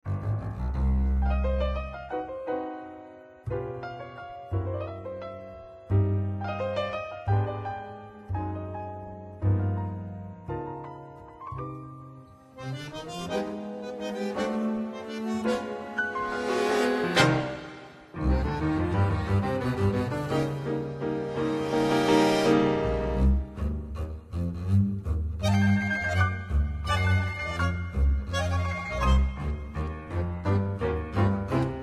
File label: Tango; Jedvard Grig; V peshhere Gornogo Korolja